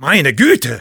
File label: negative vocalization, de; Sniper; "Meine Güte!"